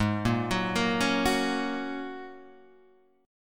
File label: G sharp 7th Suspended 2nd Suspended 4th Guitar Standard, G#7sus2sus4 chord; G#7sus2sus4 arpeggiated; 4,1,4,3,2,2; JGuitar